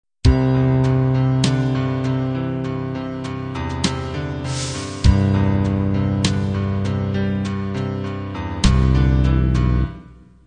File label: country-pop ballad intro